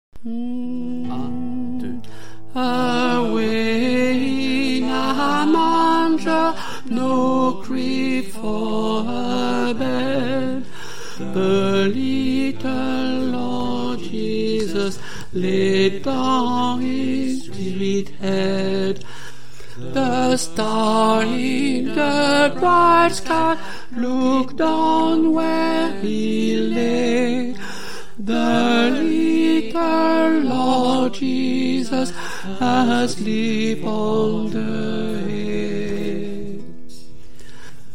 Away in a manger TENOR, chorale

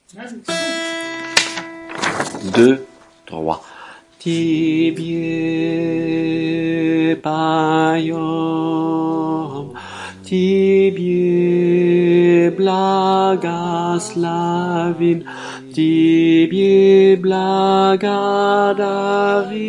SOP, chorale; Tibie Paiom

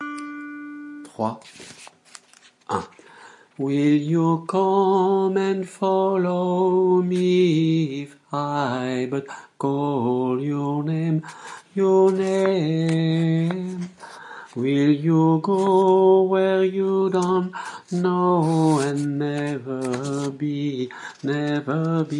altos, chorale

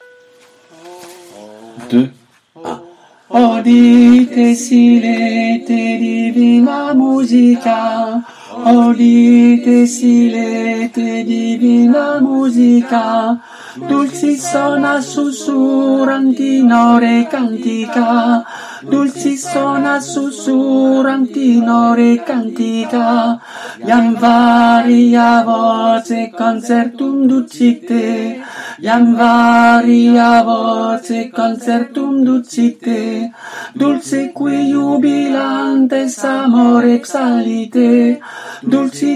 soprano, chorale; Audite silete

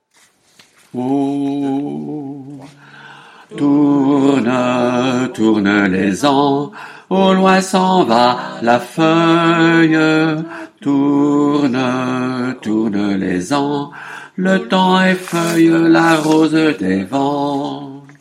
basses, chorale; ronde

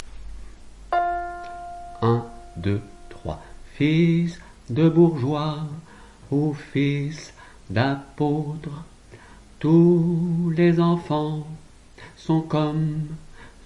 Fils Sop; chorale

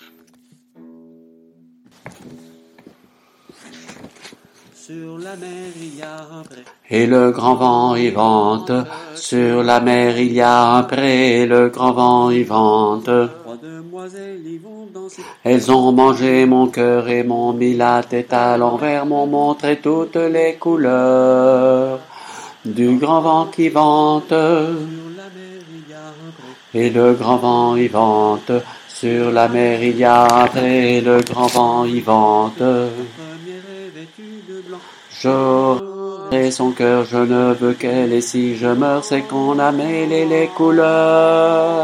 Altos, chorale; Grand Vent

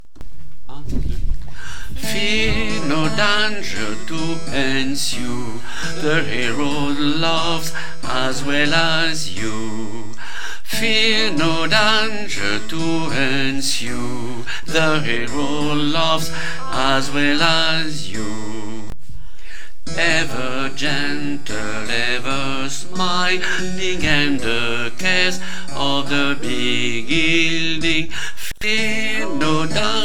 BASSE, chorale; Purcell suite2

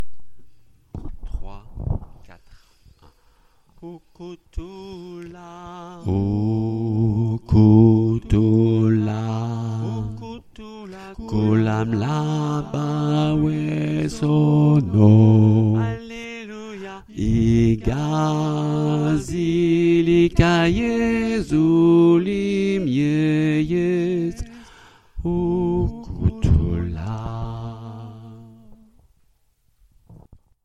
basses, chorale; Ukuthula

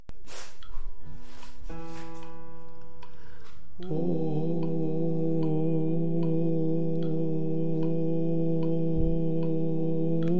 ALTO complet, chorale

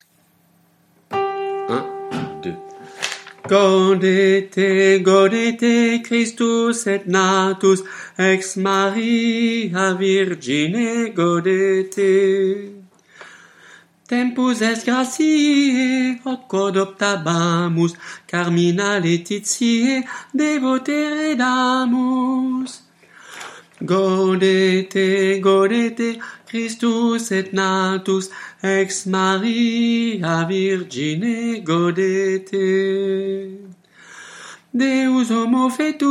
Gaudete alto, chorale

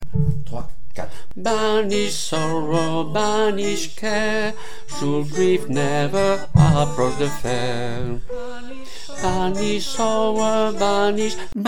ALTO, chorale; Banish Sorrow

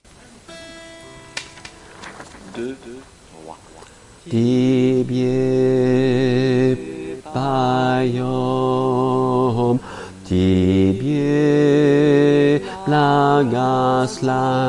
ALTOS, chorale; Tibi Paiom

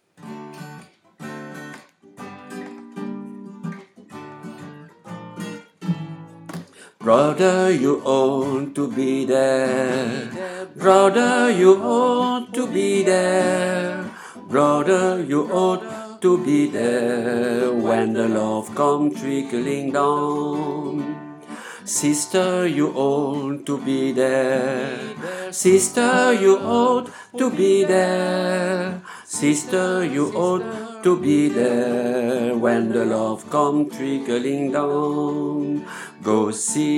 When the Love alto; chorale

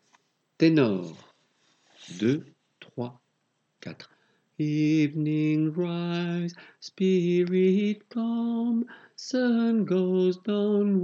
tenor, chorale

